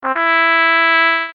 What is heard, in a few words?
score bonus; emotionhero